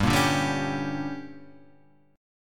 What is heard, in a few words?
D Major 7th Suspended 4th Sharp 5th, chord